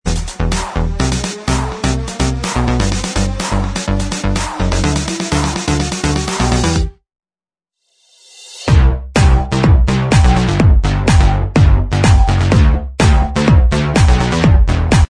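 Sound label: instrumental Track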